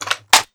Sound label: assault rifle clip in; tf2